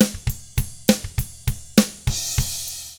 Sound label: ROCK, Drums